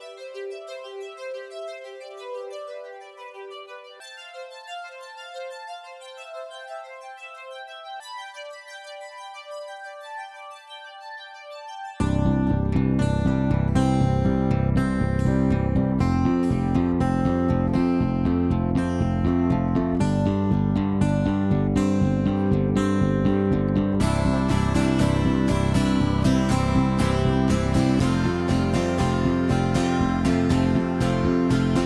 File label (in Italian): musical